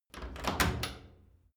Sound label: wood door old lock